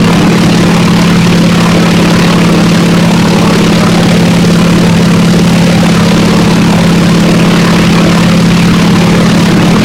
yak rear, engines; War Thunder

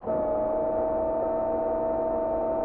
Flaps In, Aircrafts; DCS

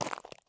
walk slate; Escape from Tarkov

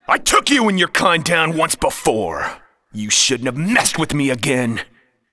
fight

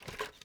ui pickup plastic junk; Rust

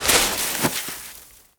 foot snow slide end; footsteps

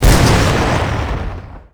explosion; buraq

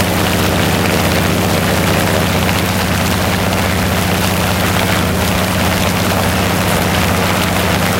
engine ai plane rpm 50 close; War Thunder; engines

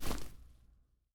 Footsteps Grass; DCS

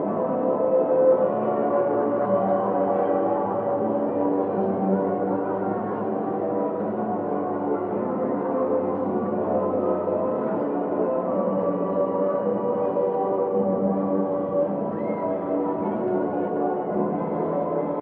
ambient port; World Of Warships; port3d

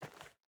footstep hide dirt walk; Rust

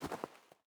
footstep boots dirt walk; Rust

